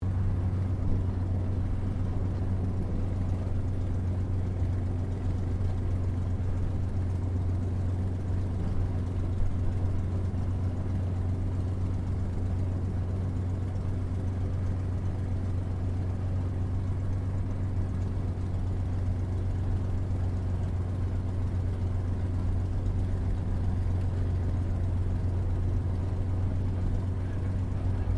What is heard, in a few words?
AIRCRAFT CAC CA-18 MUSTANG MK23 PREFLIGHT INTERIOR; CA-18 /P-51 Mustang; Airplanes; Vehicles; Aircraft Collection; Twistedtracks